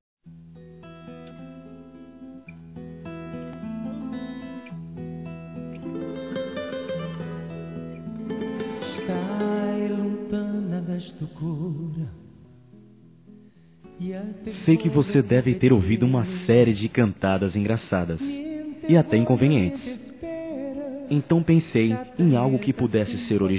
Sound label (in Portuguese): MASC, Conquistas Cantadas; Telemensagens 2017